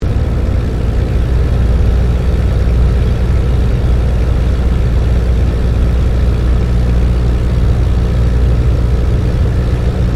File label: AIRCRAFT DH82A DEHAVILAND TIGERMOTH LANDING INTERIOR; RAAF Tigermoth; Airplanes (Sound Effects:Vehicles)